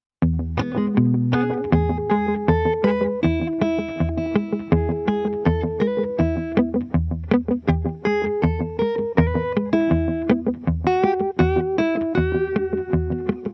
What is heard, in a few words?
blues track